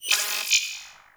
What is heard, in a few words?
Rail Jack Tech One Off